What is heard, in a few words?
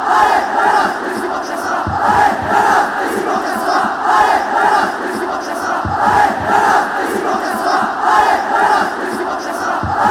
alay alay alay